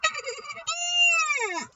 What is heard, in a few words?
Baiji Squeak